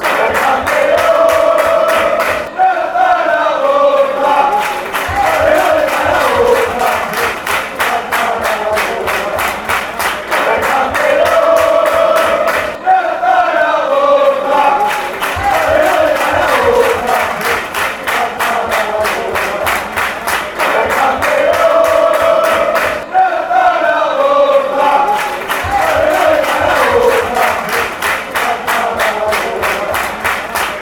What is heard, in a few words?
come on champ, chants